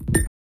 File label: Tech, Weird Side Samples